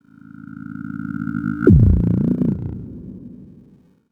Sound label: relay signal